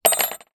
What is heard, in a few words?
industrial drill bit down on work bench; zapsplat